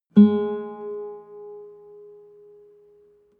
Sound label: nylon guitar single note